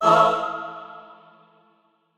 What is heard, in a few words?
chor